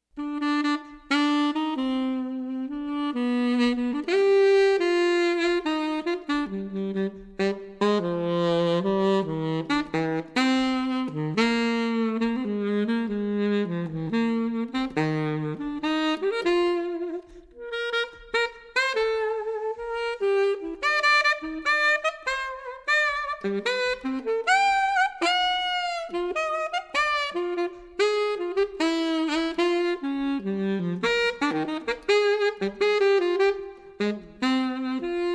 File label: Fugue; Chamber Music